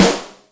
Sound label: Club OR rim, Yamaha DTXpress Acoustic Snare